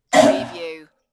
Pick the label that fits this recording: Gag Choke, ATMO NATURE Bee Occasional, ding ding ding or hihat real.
Gag Choke